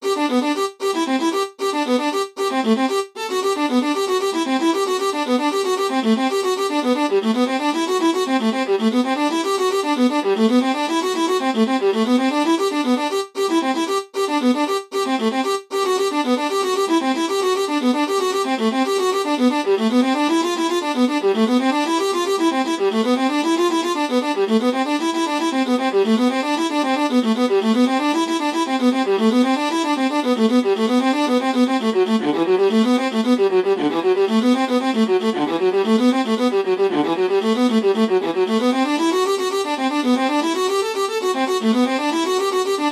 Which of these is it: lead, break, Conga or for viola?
for viola